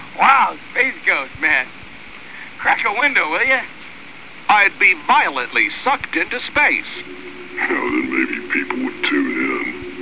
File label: crack